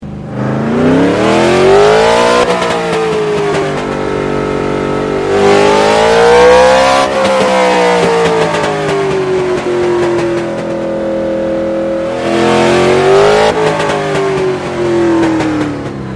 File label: BIG V8 In car Stereo Onload Steadyload Offload